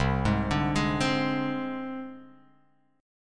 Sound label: C Suspended 2nd Sharp 5th C Guitar Open F; arpeggiated; 0,3,2,3,3,x; JGuitar